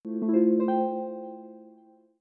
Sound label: Windows Foreground